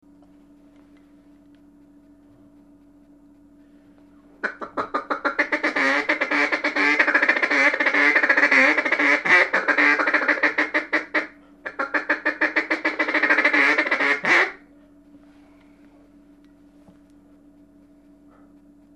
Mallard Feed Call, Duck

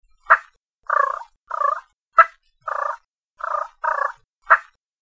Turkey Purr; ORION

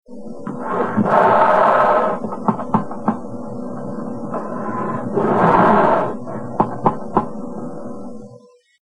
Bear Black Chomping & Snorting; ORION

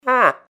Bull Grunt Single, Moose; Dave Kelso

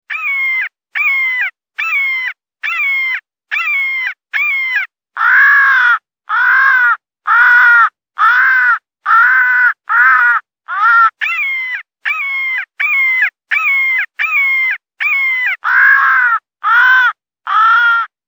Crow hawk distress

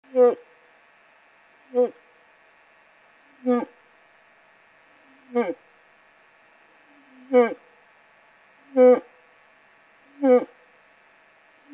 Old Bull In Love Hound Dogging, Moose; Dave Kelso

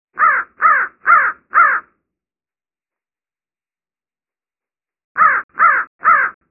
Turkey Locator Crow

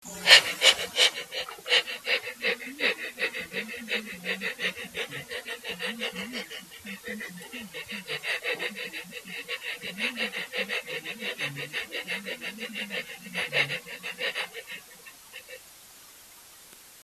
Panther Pants; Leopard; ORION

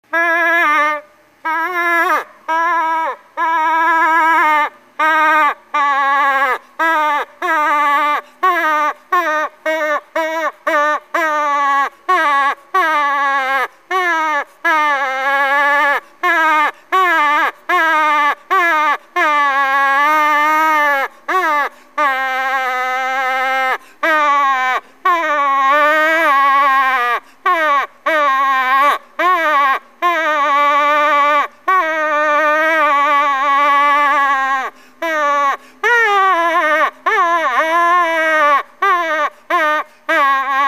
Summer Calf In Distress, Moose; Dave Kelso

